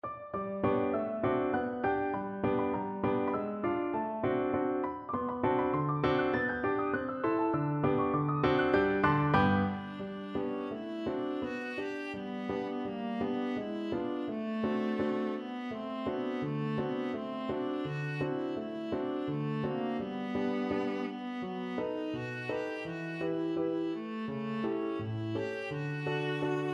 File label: viola